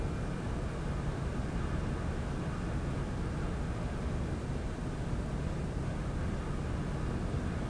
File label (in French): interieur